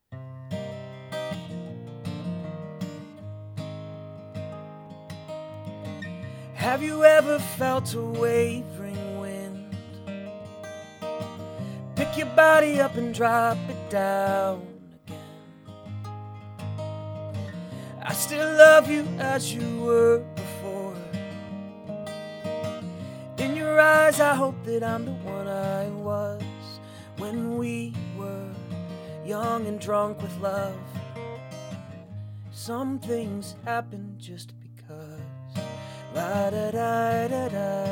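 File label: Music, M-Audio Figure 8; usb mic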